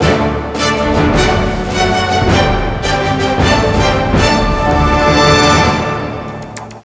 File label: Victory; tom clancy s endwar; nds